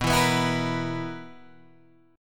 B Major 7th Suspended 4th B down Guitar Open G

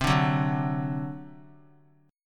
C Suspended 2nd Flat 5th C down; Csus2b5 strummed

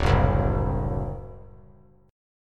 D 7th, down; D7 strummed; JGuitar

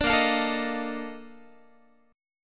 Bb Major 11th Bb Guitar; strummed

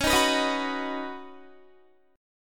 Db 7th Suspended 2nd Suspended 4th, Guitar

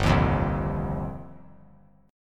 G 7th Suspended 4th Sharp 5th; G7sus4#5 strummed

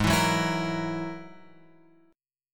G sharp 7th Suspended 2nd Sharp 5th; G#7sus2#5 Chord {4 7 4 X 5 6} strummed

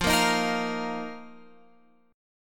F 7th Suspended 4th F down Guitar Open F; strummed

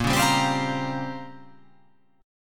A sharp Minor 6th Add 9th A sharp down, chord

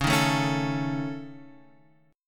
C sharp Suspended 2nd Sharp 5th, C sharp

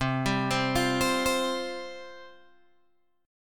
C Suspended 4th C, Guitar Open G

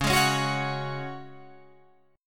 C sharp Suspended 4th C sharp down Guitar Drop D

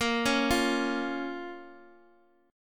Bb Minor Bb down

